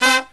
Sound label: LOW HIT, Brass